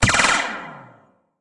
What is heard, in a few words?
turret Spread Fire; gamegape